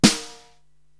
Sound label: SD Brush Hit, Korg TR-Rack drum machine